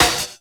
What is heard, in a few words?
SD, Drum & Bass, 150 BPM; Ueberschall; Drum & Bass Resonance